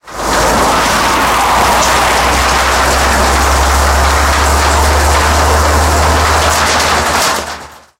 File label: turn wheel, tanks; War Thunder